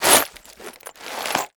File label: Nails Box Empty; Tools; 1001 Sound Effects